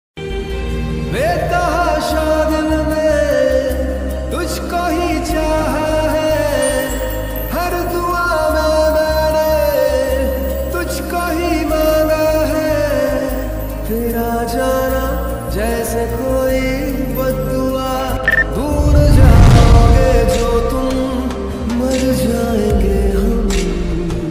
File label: Ringtone